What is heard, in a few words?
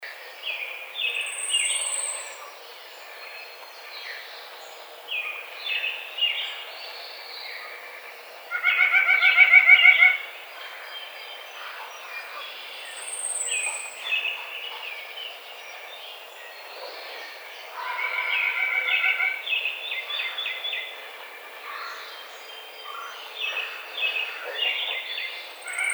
White-breasted Nuthatch